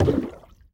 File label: Monkey Footsteps Water; BTD6; Legends